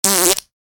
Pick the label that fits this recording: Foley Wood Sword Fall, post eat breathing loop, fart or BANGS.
fart